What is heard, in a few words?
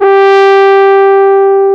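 French horn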